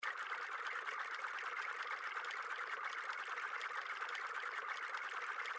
Reel Spin; Majestic India

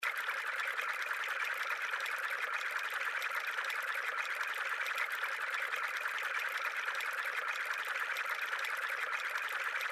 Reel Spins, slots; Thanksgiving Blast

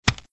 button, roulette

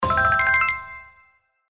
Symbol Wild Win; slots; Wildlife Adventure

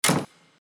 Reel Spin Stop; slots; Realm Saga

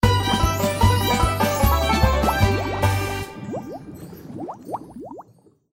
SFX JACKPOT ANIM, SLOTS; FinWin

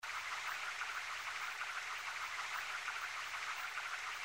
REEL SPIN; Zodiac Millionaire

